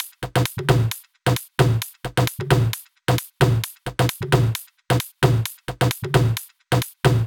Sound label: 132BPM TomTom Kit